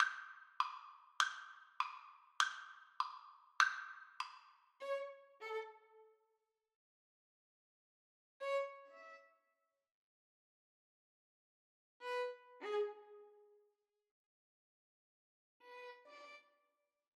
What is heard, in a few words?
violin duet